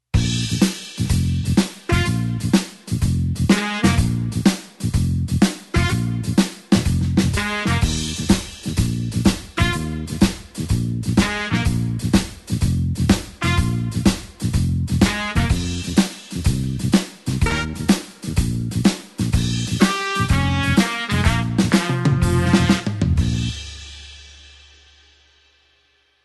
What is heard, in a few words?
blues horns shuffle Bb